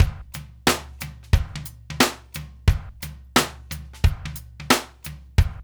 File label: DRUMS, Funky Ass Loops; East West